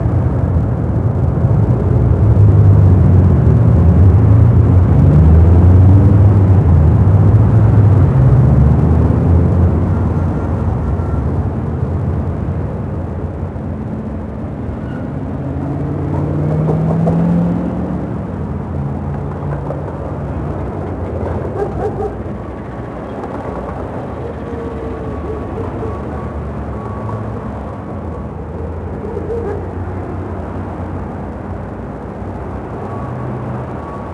City Ambience; noise; Max Payne; XBOX

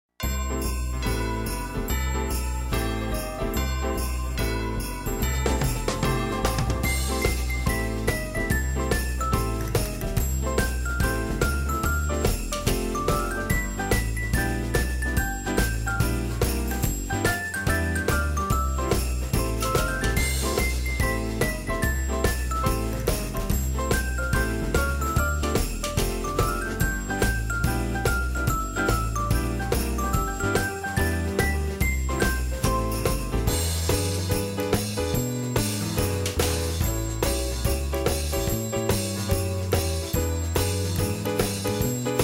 ambient; Outlaw Golf 9 Holes Of Xmas

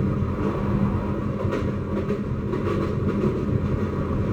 train interior move; prague; Soldier Of Fortune II Double Helix; XBOX; ambience

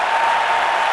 CROWD LOOP

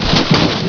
bush; Chase Hollywood Stunt Driver; XBOX

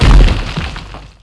object break; movers objects

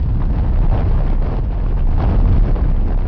volcano; Ambient; MX vs ATV Unleashed

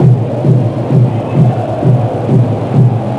Chairs, Crowd; David Beckham Soccer; XBOX

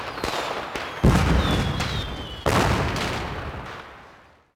Fireworks; Ambient; MX vs ATV Unleashed

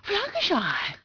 F happy

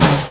steam HIT; The Elder Scrolls III Morrowind GOTY; XBOX

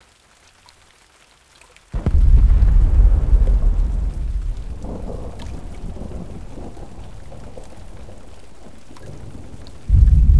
rain War; Terminator 3 Rise Of The Machines; XBOX

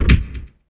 SKILL UP; UFC Tapout

